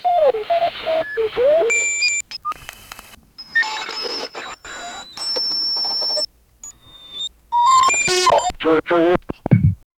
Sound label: changing tv channels a sl